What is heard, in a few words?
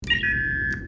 computer beep; Trek